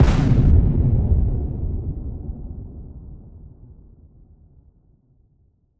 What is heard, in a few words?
High Shot, Hits